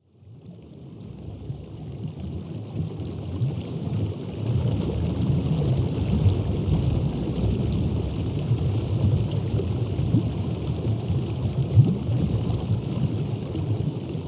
LOW WATER, DEEP ATMOSPHERICS; Sonic Foundry (Sony Creative Software); Vir Unis Infusion

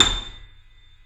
YC7 piano, pp; Roland L-CD701; pp YC7 St

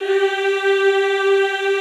"G3", choir; Best Service; Extended Classical Choir

